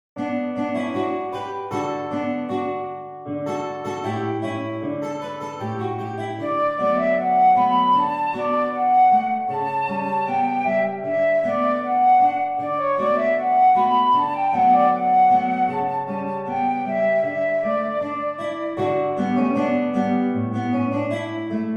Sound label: i have a bonnet flute